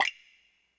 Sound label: click; lumeno